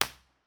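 tunnel entrance a 1 way mono, impulse response, Innocent Railway tunnel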